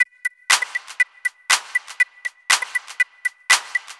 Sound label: Combo Hat